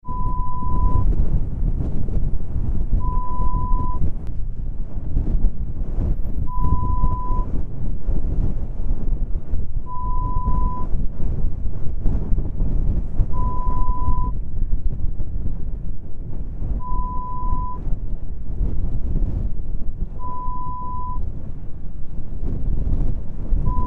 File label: wind sound